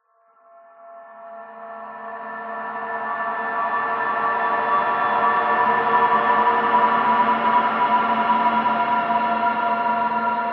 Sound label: swell transition slow cold metallic left right resonant; sound design accent; dissonant; skyclad sound